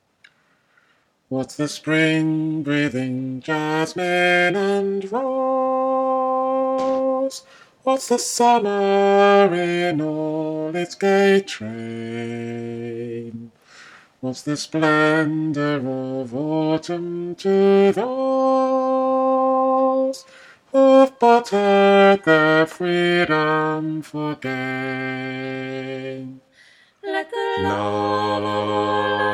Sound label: bass